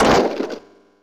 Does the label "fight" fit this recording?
No